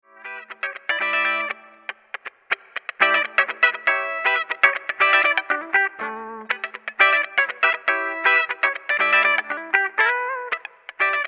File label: pluckin and rhythm